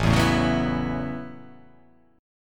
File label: A 6th Add 9th, A6add9 chord; strummed